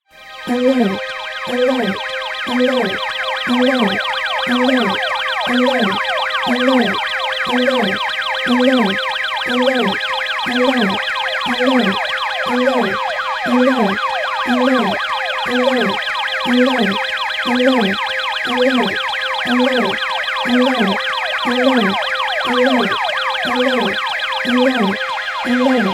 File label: Alert Alert Alert